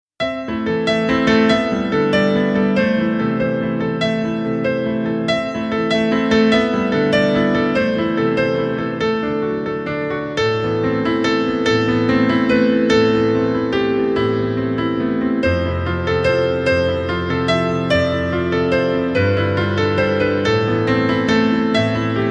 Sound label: Film Piano; klavír